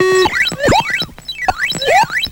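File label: FX right, attacks; Best Service Advanced Media Trax Modern Composer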